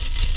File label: Spinning Platform; Forest Temple; Zelda; OOT